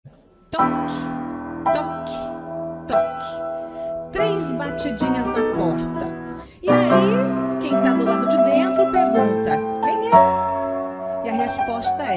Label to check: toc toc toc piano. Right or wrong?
Right